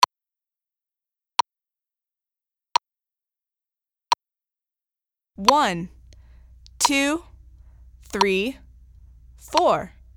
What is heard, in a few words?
Piano Slow Click; Finger Numbers